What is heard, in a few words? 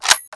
sniper boltback; svencoop